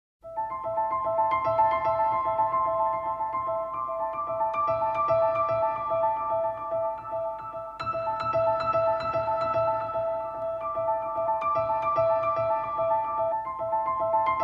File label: PIANO